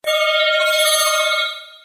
Chime; Psycho-Pass

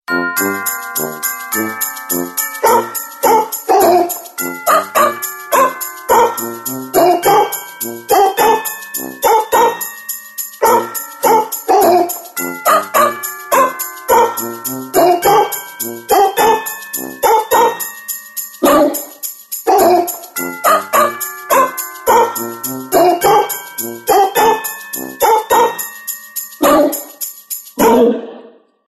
XMas ringtones

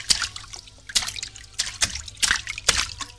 sony x neo; ringtones; sms

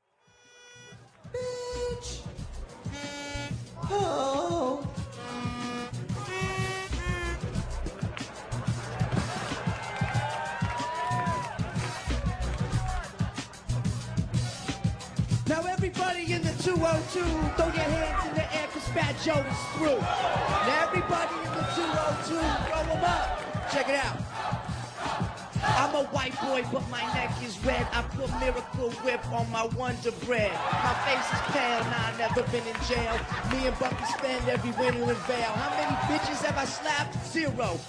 rap battle; scary movie 3

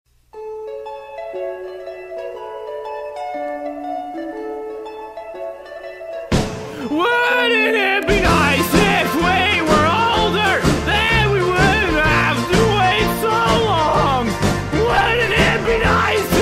singing

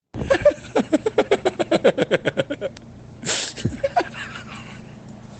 Laugh

